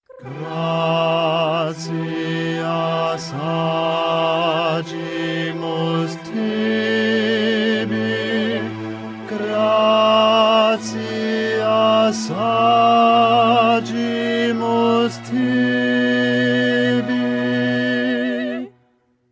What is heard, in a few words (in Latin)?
gratias agimus tibi baixo